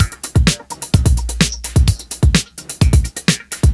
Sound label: House Beat, 128 BPM